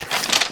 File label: Gun Holster Weapon, Weapons Foley; SFX Kit